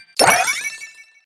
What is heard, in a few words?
Door